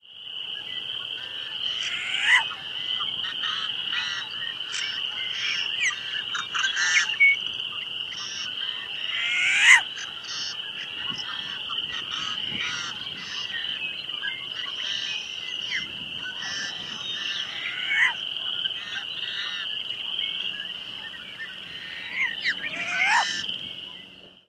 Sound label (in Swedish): Jorduggla